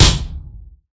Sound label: Impacts; Just Impacts; Extension I; soundbits